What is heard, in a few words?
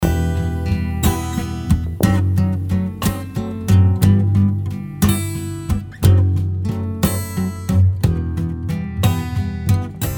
acoustic blues